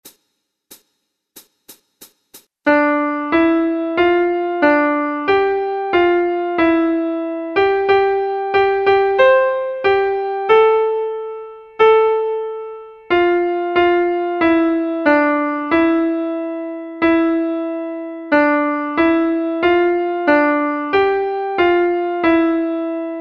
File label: Instru Soprane; Messe de Saint Jean Agnus